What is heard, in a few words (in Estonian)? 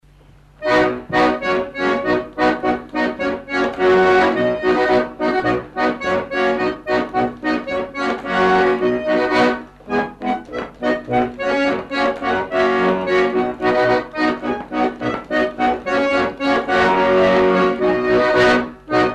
loots folk; Karksi; Savikoja venelane; Johann Palu